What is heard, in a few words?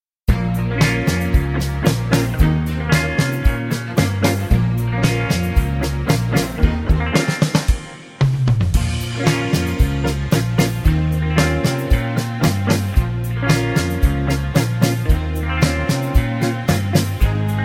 Ab No Backing Vocals, backing tracks